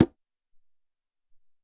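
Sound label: Conga High Mute, Alesis SR-18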